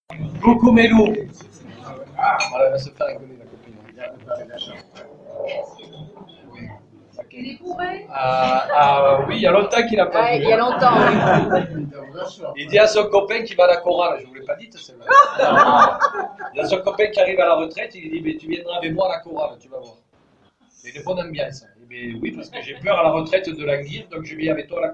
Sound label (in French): chorale